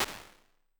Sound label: Early Reflections Locker, ImpulseResponses; Ableton Live 12 Lite; Hybrid